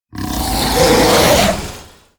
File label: Midnight Drake